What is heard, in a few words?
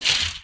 human step